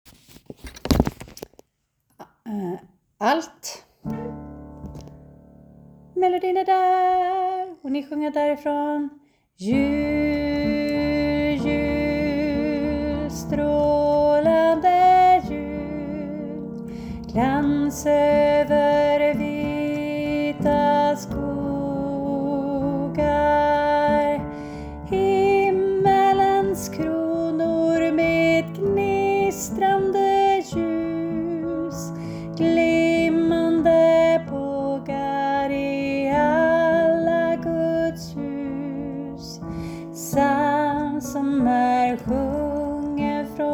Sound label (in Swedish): Jul jul alt; korsang